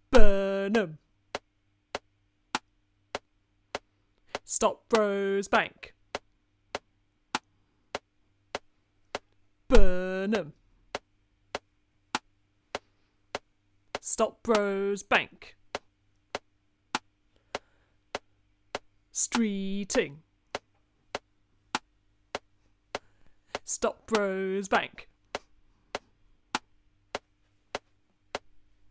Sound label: optional voice